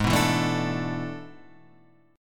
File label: A Major 7th Suspended 2nd Suspended 4th G sharp, guitar chordshape